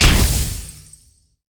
ghost come

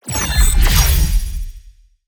ui bossround single

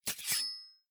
sword

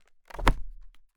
book close

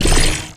dark staff eye

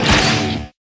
p atk gtr

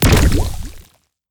imp water magic

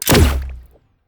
teapot

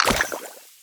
smoke bomb

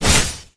spear

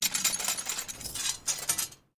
sword quake

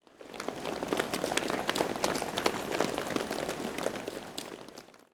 crowd run

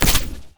pen crossbow

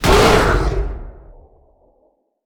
skill wolfroar

